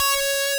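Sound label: DISTORTED SYNTH C#5; AKAI S-Series CD-ROM Sound Library VOL-7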